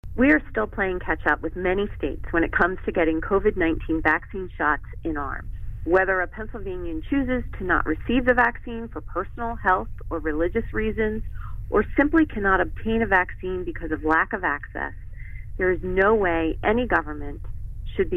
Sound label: shots